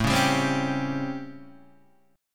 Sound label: A 7th Sharp 9th; A7#9 Chord {5 4 5 5 2 3} strummed